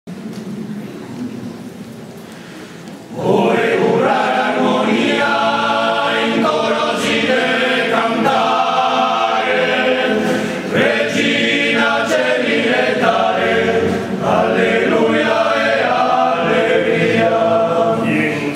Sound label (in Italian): regina coeli sardo voce